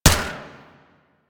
Single Viper Fire, weapons; DE; Warframe; Wraith Single Viper

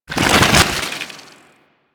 Luck Activate Ice; Warframe; Warframes; Dragon

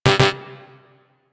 UI Common Error; Warframe

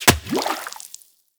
Bow Arrow Impact Water; Weapon Impacts; Player; Bows; Hunter Bow; Environment; Envoy Hunter Bow

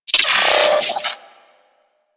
Arachnoid Micro Vocal Pain; Warframe; Corpus

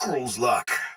damage low chance soldier sword agent male; Dialog; Barks; Battle; Melee; Sinecure

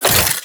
Scrap Pickup; Warframe; Missions; Zariman; Zariman Assassinate

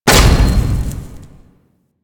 Scrap Container Open; Warframe; Missions; Zariman; Zariman Assassinate

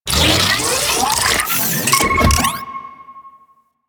Fluid Sac Device Charged; Warframe; Infested Eris; Encounters

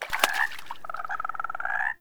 Wetland Forest Day Frog; Ambience

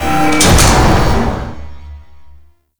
Defense Door Start; Warframe; Corpus Infested; Infested Colony Defense Objective

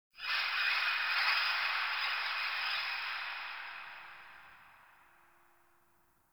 Ambience Texture; Warframe; Sentient Devourer

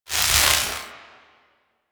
Arm Movement; Zariman Power Rift; Warframe

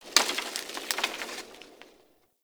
Tree Pine Branch Break; Ambience; Trees

